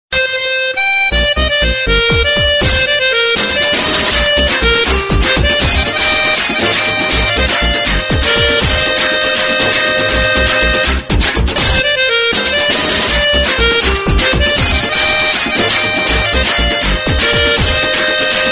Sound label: Tamil remix ringtone; airtel